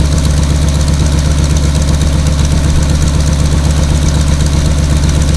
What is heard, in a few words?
rpm, F6F Hellcat; Bombable; flightgear